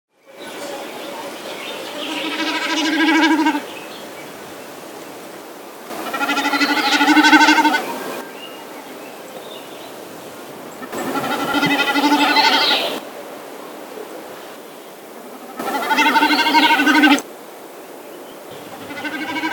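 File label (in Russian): bekas, snipe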